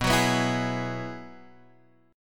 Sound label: Ab Minor 7th Sharp 5th